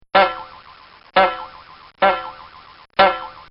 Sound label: Cartoon Sound Effects Bongs